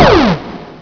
LAZER; ABREED

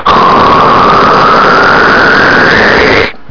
ROCKET

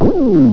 EEEWWWWW

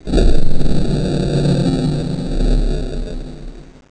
NOISE

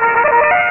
fanfare

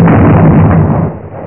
EXPLOSION; zombieii

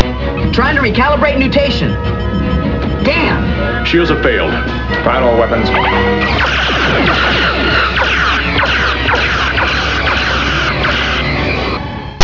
FIRE ALL

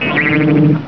BLINK

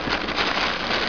RUSTLE

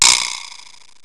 Weirdo

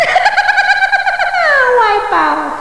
WIPEOUT

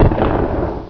AA GUN

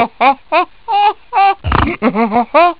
mad cow

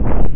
SPIKE

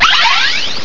LASER

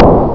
shoot

